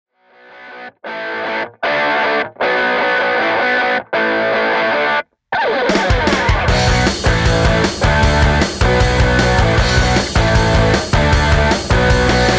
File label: 155bpm remix